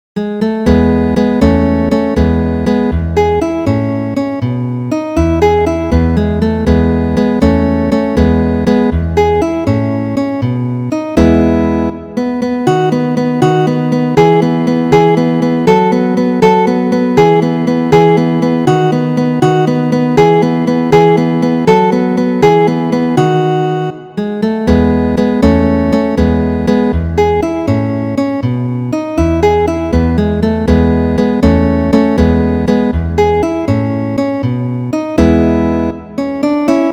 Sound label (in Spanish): guitarra alegreto; Carulli, Ferdinando